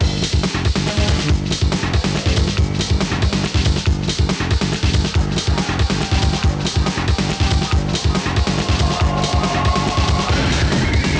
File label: Bgm Battle